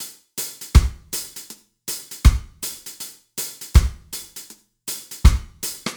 REGGAE, Drum Loops, 80

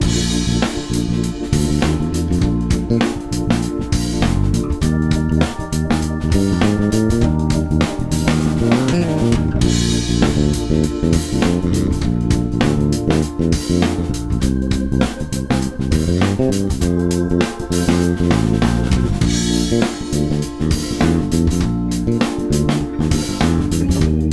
funk bb maj; scales Gmaj

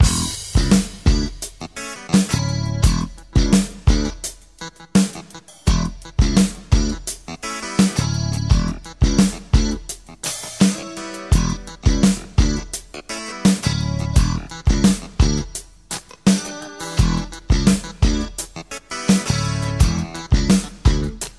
melo alte7, scales; scales Gmelo

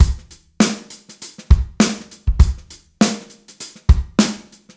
FUNK, 100, Drum Loops

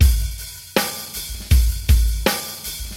Rock, Drum Loops

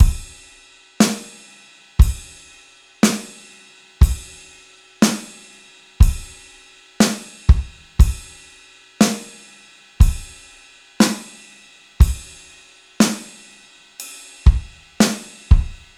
ROCK RIDE, Drum Loops, 60

